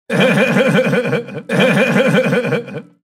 laugh ringtone; seth rogen

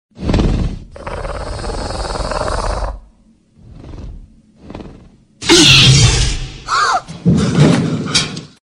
alien sound

